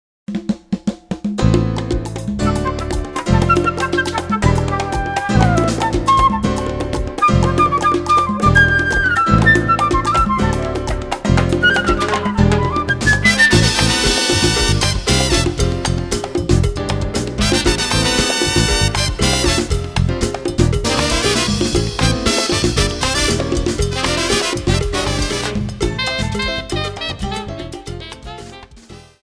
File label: latin jazz